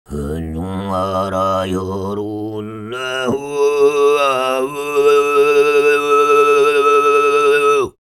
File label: SOLO, TUVAN RASPY, World Voices; Spectrasonics Vocal Planet CD5